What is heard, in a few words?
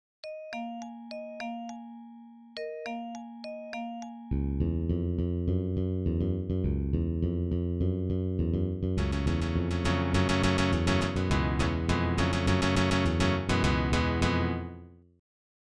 processed beats intro